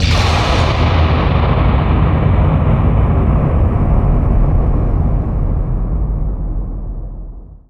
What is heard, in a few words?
thunder, halloween ambient; Garry's Mod; tf2